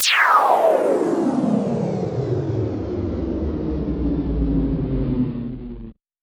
Sweeps Down

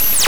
DOME DRUMS; Distored Reality

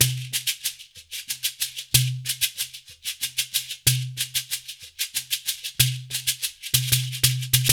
Bembe 6/8, World Dance; Spectrasonics - Supreme Beats - World Dance

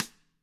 CAMCO SNR; ILIO; Double Platinum Drums 1

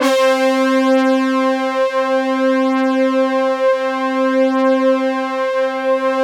BRASS, PADS; Masterbits; Vintage Voltage

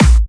Electro Kicks

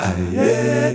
Male Voice, African Voices

